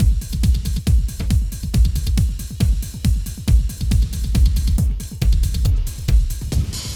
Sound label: Clubbin' 138